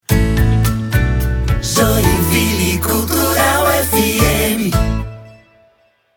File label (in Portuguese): VINHETA CANTADA